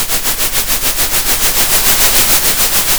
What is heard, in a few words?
pop, Sound of a train